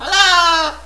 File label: bird talk hello